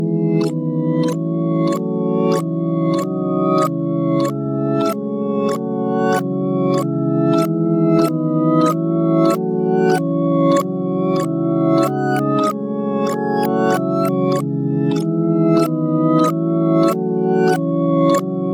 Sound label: Lullaby reverse; Turtle Head Unmasked; Windows